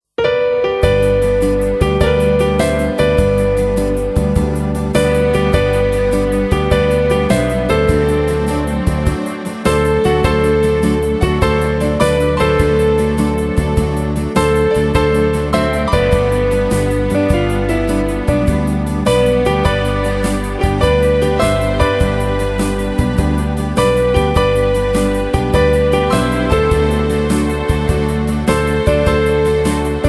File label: Piano